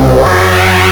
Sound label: STACK08, Big Beat Samples; AKAI; STACKS KIT